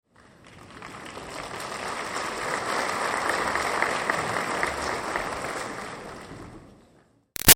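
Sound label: Crowd Applause Audience Graduation Light; Auditorium; Human; Big Room Sound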